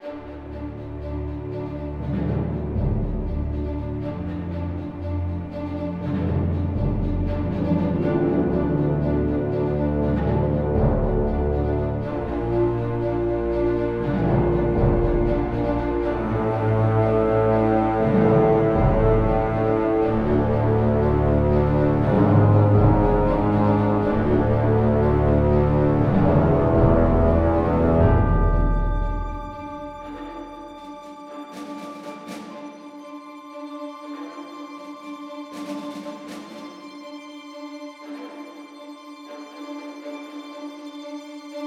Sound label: Starfighter Music; Galatic Starfighter